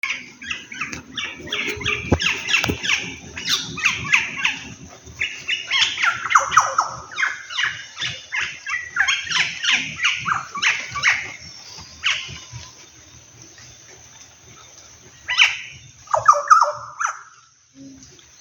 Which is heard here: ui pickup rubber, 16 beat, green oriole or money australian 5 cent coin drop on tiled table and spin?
green oriole